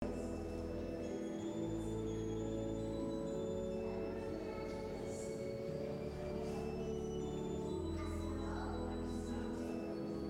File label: Cathedral Organ HV20